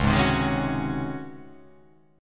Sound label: Db Major 7th Suspended 2nd Suspended 4th Db; x,4,6,5,3,3,3,3; DbM7sus2sus4 strummed; JGuitar